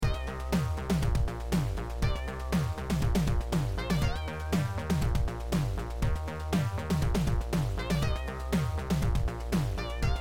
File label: bass basstone lead sound beat